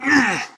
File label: pain; tf